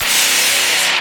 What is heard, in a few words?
GTR SLICER, Synth FX Misc